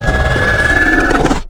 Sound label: dragon scream